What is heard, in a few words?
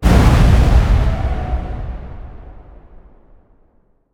phoenix death explode; smite; npc